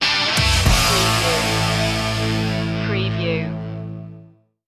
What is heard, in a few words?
fuzzbomb stinger